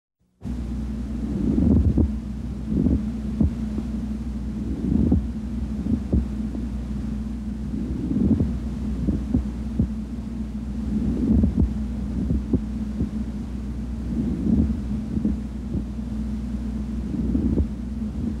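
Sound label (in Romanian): frecatura pleurala lob mijlociu drept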